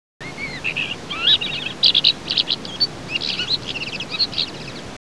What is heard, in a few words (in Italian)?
averla piccola, canti uccelli; mondo montagna